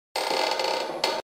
door hinge

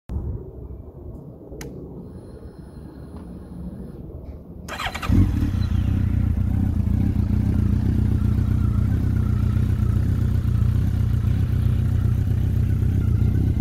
CB 1300

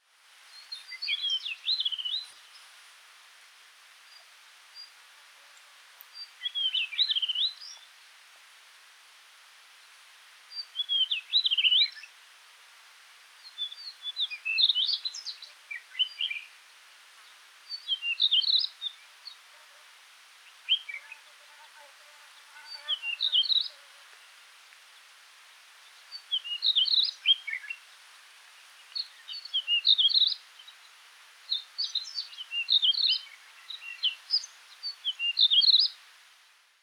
Jerdon's bush chat

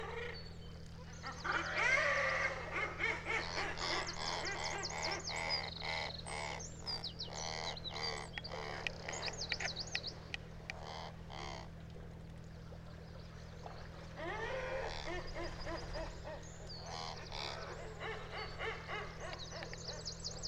Razorbill

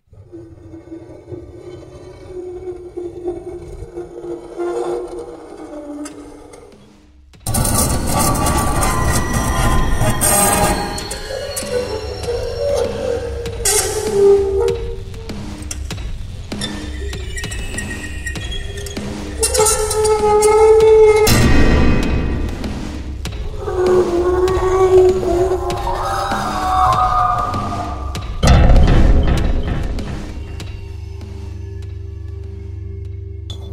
Glass Scratch

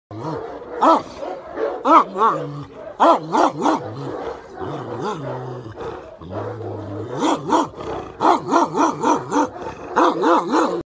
sarabi dog; پارس سگ; سگ سرآبی

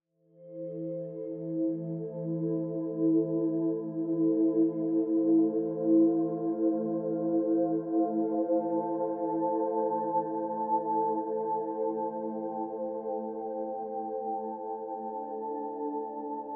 superspacy atmosphere

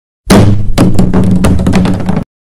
plastic pipe

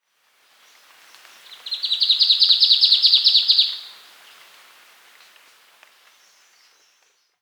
Hume's treecreeper

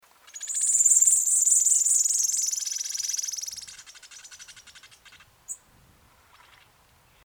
Zapata sparrow

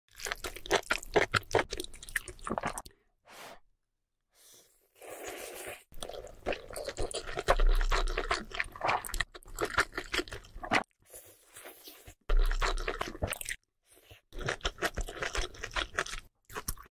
Edible noodles

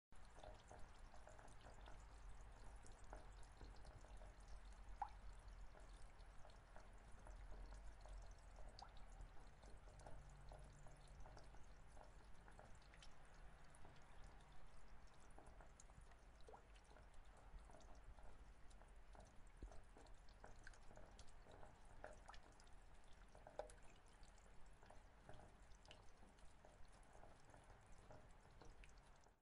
Rain on gutter

